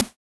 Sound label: Alien FM Perc Short